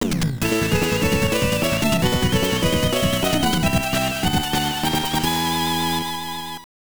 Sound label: Stage Clear; magical chase; turbografx-16; Phew~ Phew~